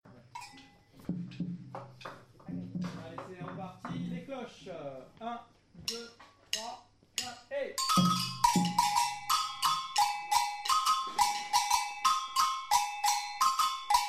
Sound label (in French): afoxe break intro complet court, batucada